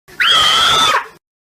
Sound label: stop